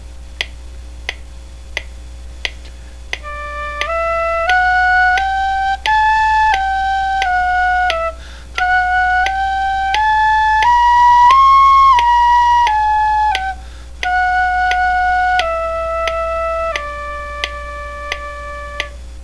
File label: slurs, whistle